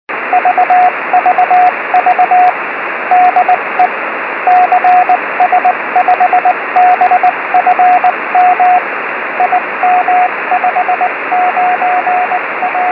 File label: 70166khz; cs5bfm; pe1itr; 70mhz